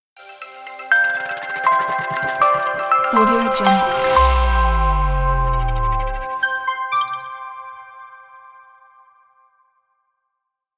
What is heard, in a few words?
clear transitions logo